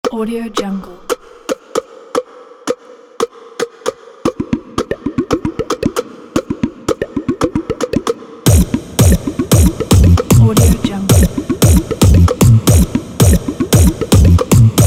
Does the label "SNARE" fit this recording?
No